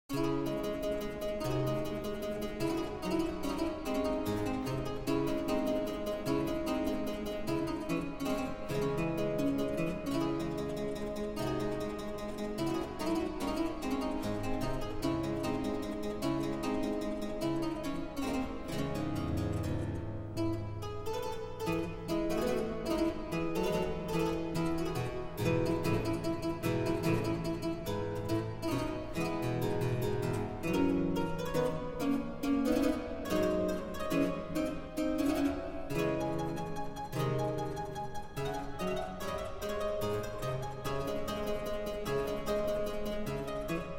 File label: Praeludium & Fuga, Ouveture burlesque in D minor; Georg Philipp Telemann